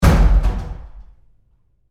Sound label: Door Bathroom Stall Close Roomy Hard